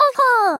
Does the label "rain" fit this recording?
No